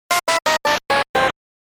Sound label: effect die